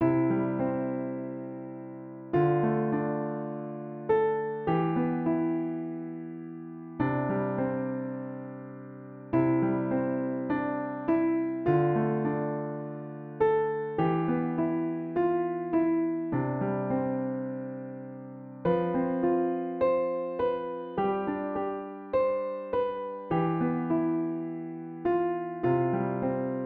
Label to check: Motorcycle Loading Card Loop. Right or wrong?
Wrong